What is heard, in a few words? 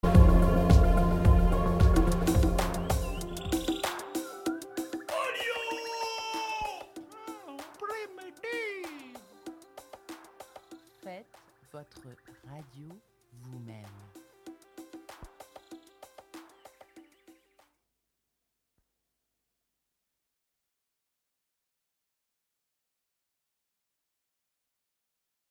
noise annoys